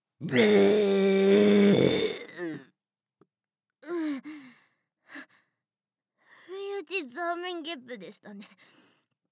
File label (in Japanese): 不意打ちザーメンゲップ; ザーメンゲップ集; ASMR